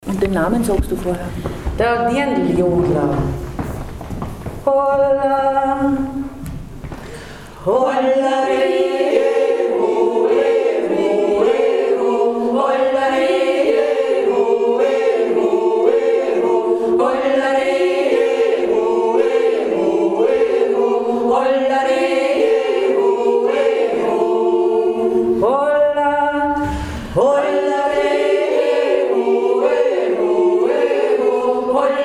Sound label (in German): dirndljodler